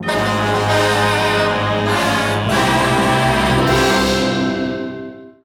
1st Place Goal Fanfare; crash bandicoot series sound box

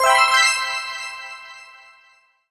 Points Ticker Bonus Score Reward Single

